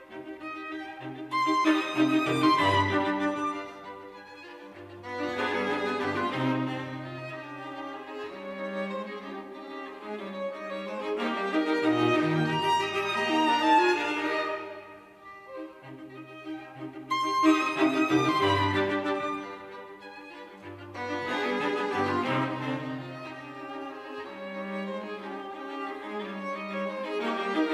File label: String Quartet in C Major, Menuetto Allegro; Mozart; K. 465 Dissonance; Movement III